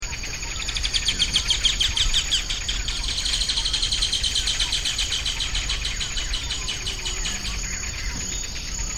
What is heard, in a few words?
amazon kingfisher